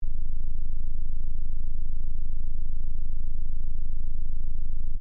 SAWTOOTH WAVE 13.75 HZ MINUS 12DB LOOP, Reference tones; Reference tones that can be used to test hearing and callorbrate equipment